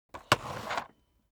object household vacuum sweep forward

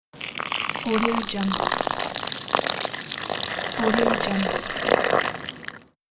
watering plant

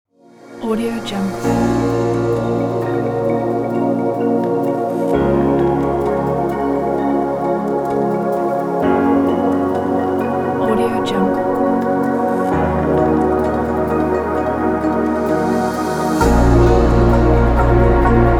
winter soundscape meditation kit

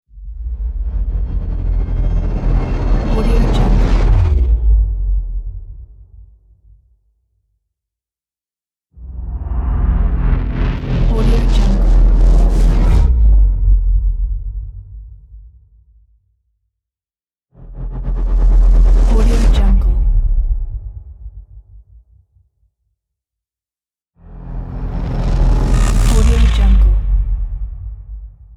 cinematic whoosh; capeaudio

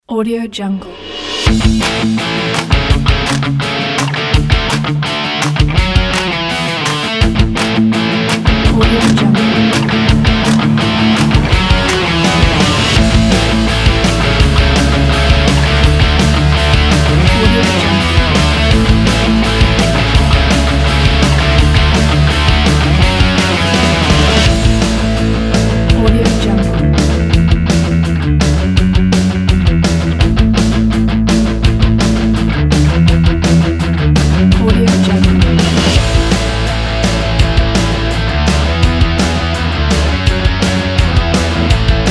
indie rock sport

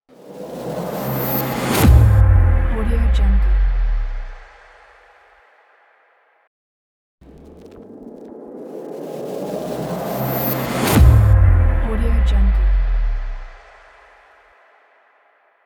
epic hit

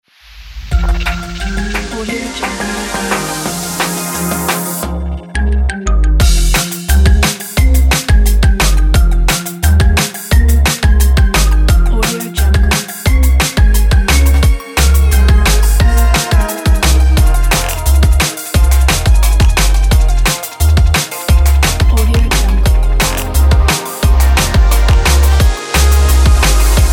drops ident

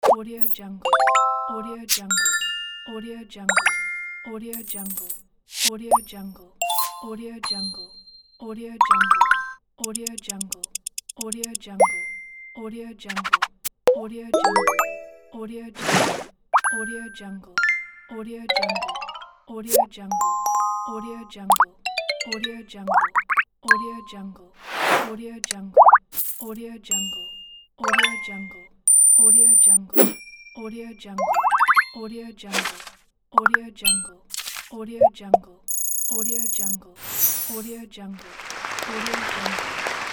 motion graphics sound effects

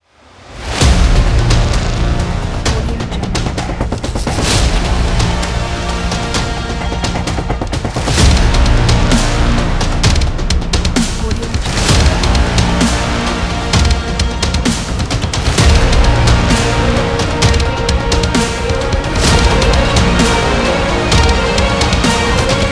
epic action trailer intro

